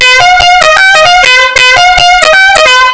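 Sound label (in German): melodie, Hupen-Soundeffects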